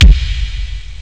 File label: MOVE; HBMonopoly